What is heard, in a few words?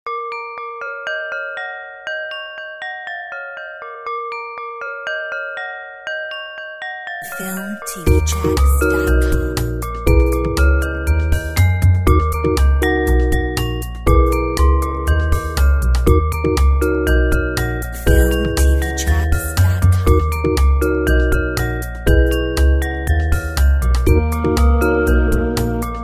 Semitic jazz